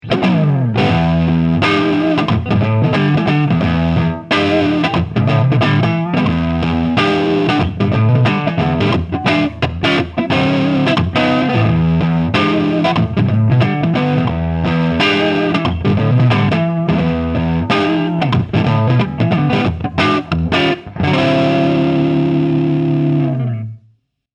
Neck PU 73 Marshall Superlead Marshall 412 260 Watt Shure SM57